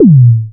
Dunk, Drums, Industrial; Sonic Foundry (Sony Creative Software)

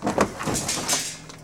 TRUCK JUN, Transportation, Truck Junk

